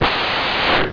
Decreasing "balloon" deflating/collapsing (metaphoric sound); Collapsed tree